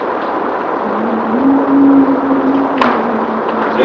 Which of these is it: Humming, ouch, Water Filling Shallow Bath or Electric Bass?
Humming